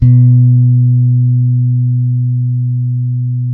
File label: JAZZ B 3, BASS; Spectrasonics; Bass Legends CD 1